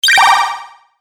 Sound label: Classic Delay Game Hit, video game sound effects